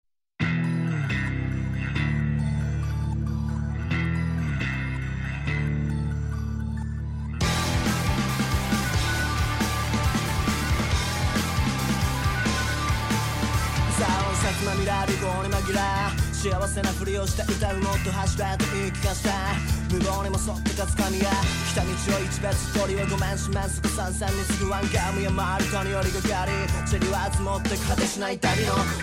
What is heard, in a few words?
volincia